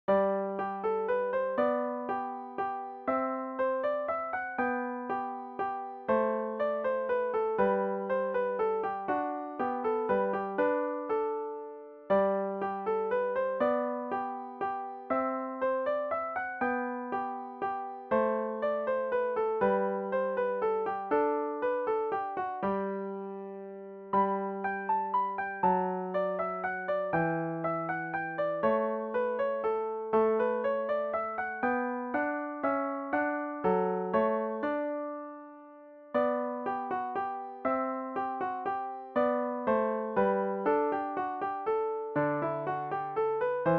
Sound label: Bach Minuet in G, piano; classical